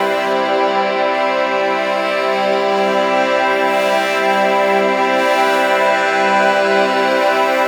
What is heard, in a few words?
Fabrik Pad